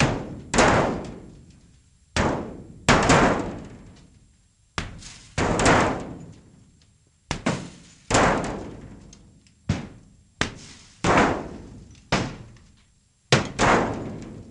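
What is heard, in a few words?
Metal Impacts Debris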